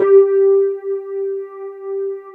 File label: WET ROAD G3 R, Keyboards Old School; USB Soundscan vol.09; AKAI